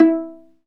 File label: STR VLA PI, Chamber Pizz; Roland L-CD702